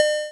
square pickup; Square Waveform sfx

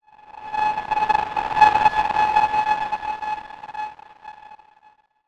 Retro Cinematic Long

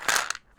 Multivitamin - Roll, Pill Bottles

